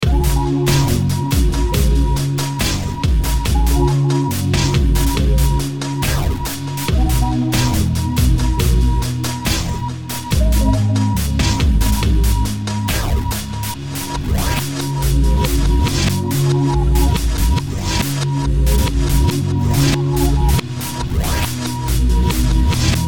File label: Sine Masking